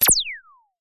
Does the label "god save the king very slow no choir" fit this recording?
No